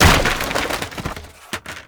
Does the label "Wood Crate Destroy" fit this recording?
Yes